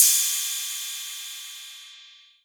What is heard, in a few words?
808 Cymbal